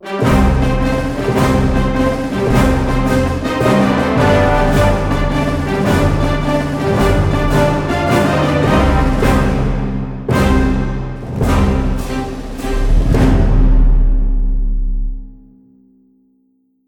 Infanterie Anthem

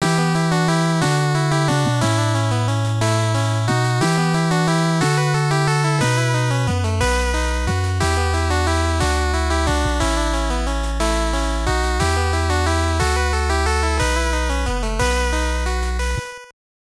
Success; solstice the quest for the staff of demnos 1990 nes

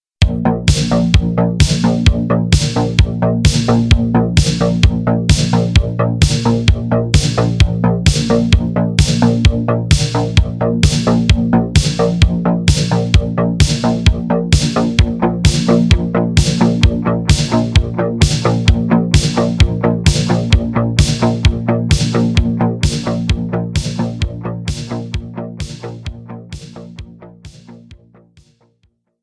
Yard Fight; Half-Life Caged; macOS; Windows

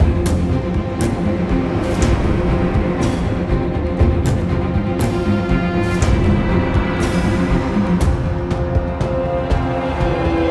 String; Assassin's Creed Unity Dead Kings

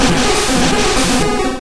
Curse Damage

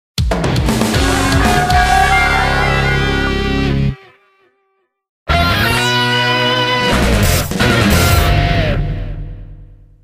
Mission Fail; Teenage Mutant Ninja Turtles 3 Mutant Nightmare

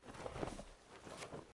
Takes Card; Pauline

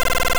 Blizzard Loop; sfx; attack moves; pokemon; gen 2; gsc

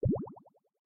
Teleportal; boomerang fu

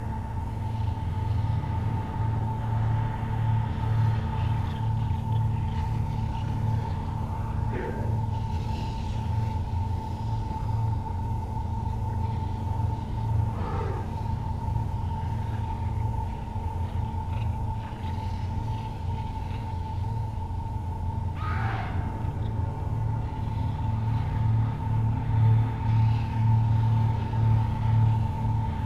Ambient

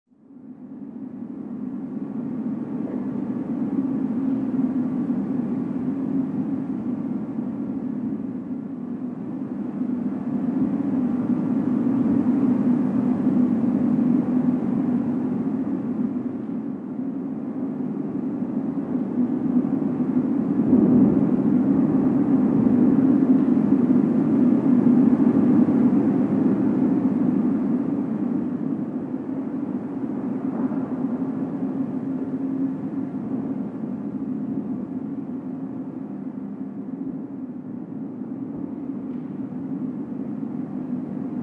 Ambient; steel fang

